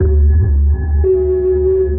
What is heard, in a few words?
sonar Ping Suit Far Shuttle Directional; Barotrauma; Real Sonar